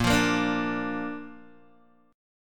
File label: Bb Major Bb down Guitar Open G